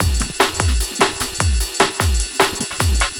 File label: Ridin Beat, Drum N Bass; Sonic Foundry (Sony Creative Software); Knutrix Drum & Bass